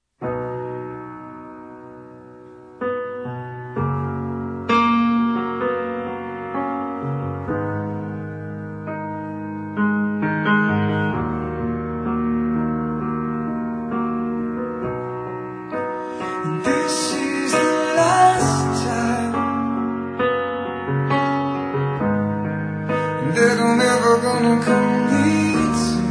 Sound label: Piano Acoustic